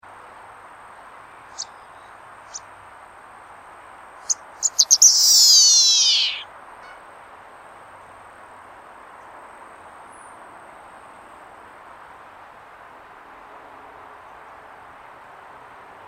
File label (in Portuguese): Canto do caminheiro zumbidor